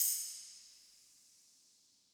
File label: Crash Drop; BBoy Maniac